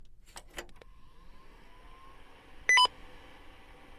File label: nec pc9821ra40